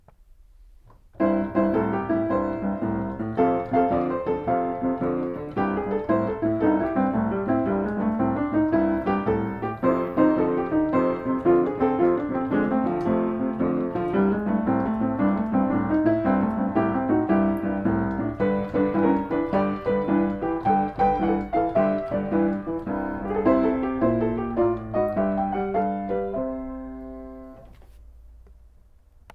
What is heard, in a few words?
short Blues